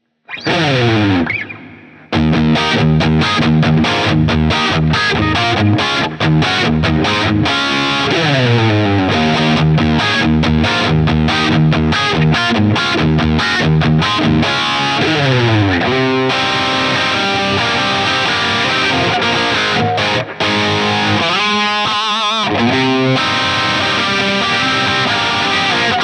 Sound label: plexi